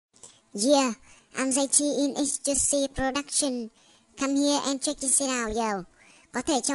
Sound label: rap; viet rap